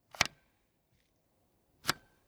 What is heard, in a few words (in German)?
Highscreen Joystick Knopf Autofire on off